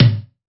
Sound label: tom wavey, Percs